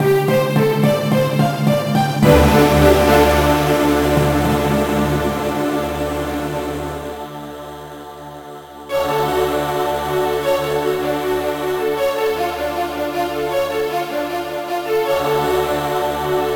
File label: game over; Populous DS